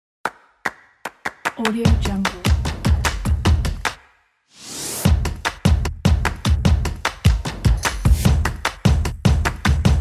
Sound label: energetic and upbeat stomps and claps